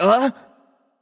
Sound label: surprised; smite; voicepack; mercury